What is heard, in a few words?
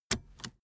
car seatbelt fasten hard